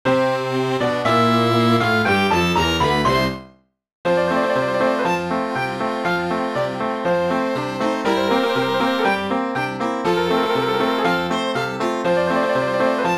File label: Thunderer March